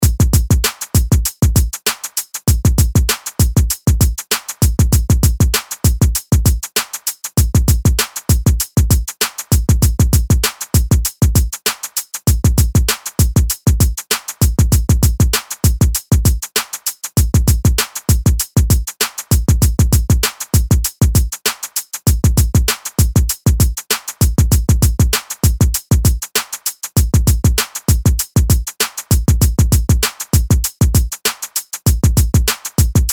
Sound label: 98BPM